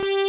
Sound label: RESOQ BS, BASSES, Synthsonic; Pro-Rec - Synthsonic